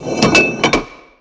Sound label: Prop mount weapon; Star Wars Clone Wars; XBOX; Xbox Live Effects